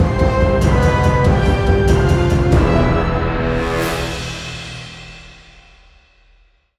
Battle Lost, Fantasy Adventure Music; Sample Audio Pack; Free Fantasy Adventure Music Pack

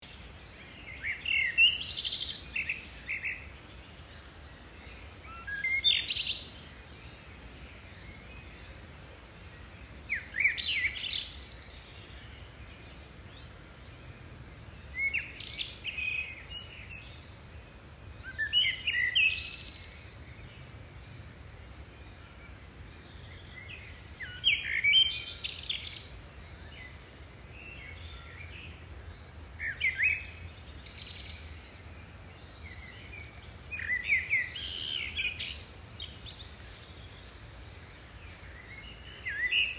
Birds Chirping

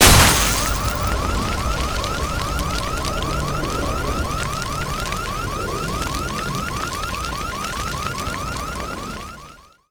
Computer explosion Alarm; Sample Audio Pack; Free Pack

